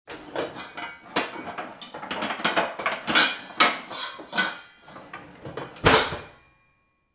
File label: kitchen; fnaf; fnaf1